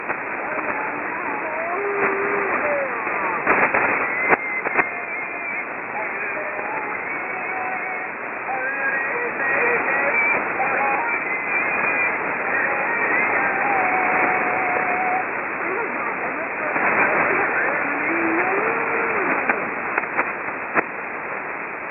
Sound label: the yodeler; Shortwave Pirate Radio